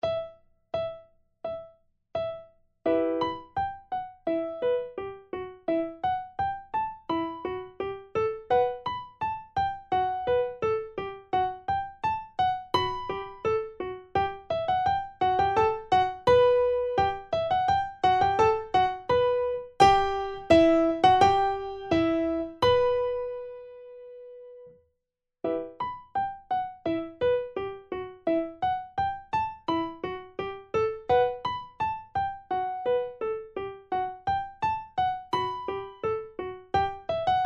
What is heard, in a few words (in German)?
Primo, Vierhaendige Uebungsstuecke; Diabelli